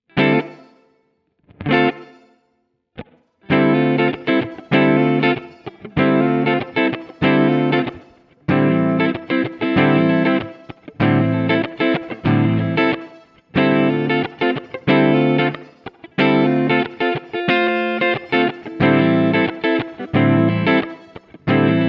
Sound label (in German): Keeley Realizer Reverb Hall Mittelstellung